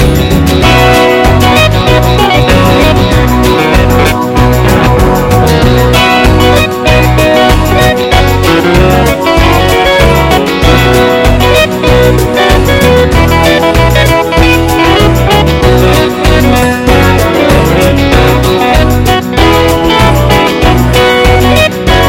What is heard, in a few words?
Happy and Quick